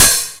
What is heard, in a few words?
OHH, Roland JX-305